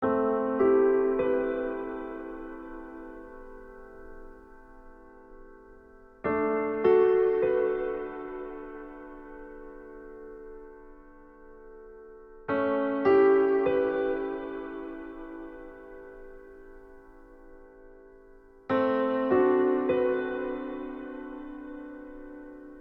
Cosmic Whale Piano; Ever17

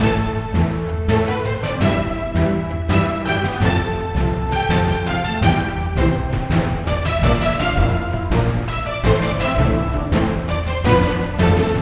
music forest; Shadow Bug; Android

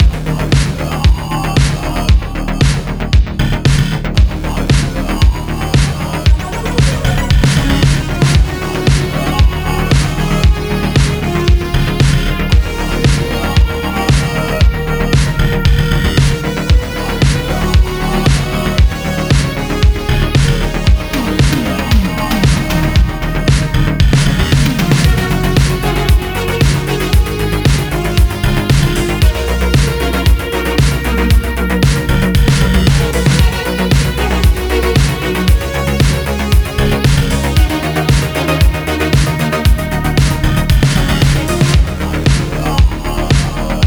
Music Wise The Wise Boss Fight 3 Loopable 115

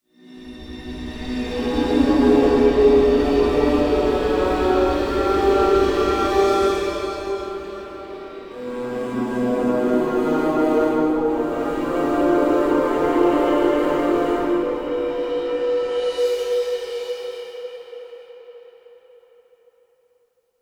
Mysterious Stinger; rise of nightmares xbox 360 gamerip 2011

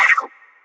Cat meow; Super Mombo Quest

